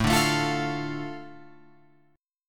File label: A Suspended 4th Sharp 5th, Guitar Open F chord; Asus4#5 strummed; JGuitar